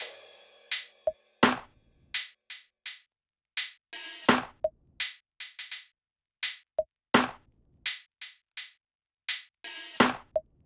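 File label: Drum Loop Top, C minor, 84 BPM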